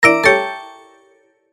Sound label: Wrong